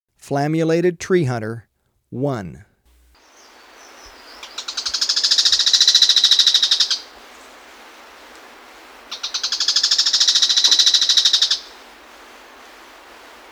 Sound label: Flammulated Treehunter, Birds of Ecuador; S. America